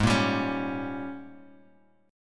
Ab Major 7th Suspended 2nd Suspended 4th Ab Guitar Open G